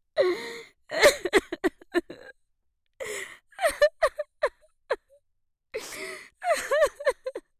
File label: Woman sobbing and crying